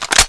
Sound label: spas insert shell, weapons